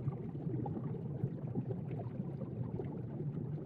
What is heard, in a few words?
spin loop; reef raider